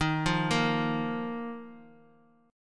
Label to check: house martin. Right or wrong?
Wrong